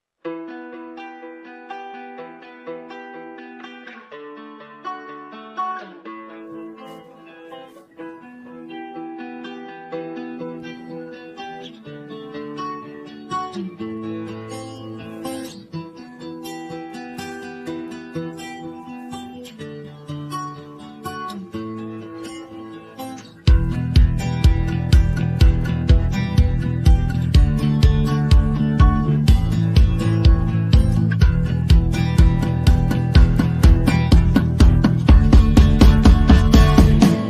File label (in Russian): karaoke minusovka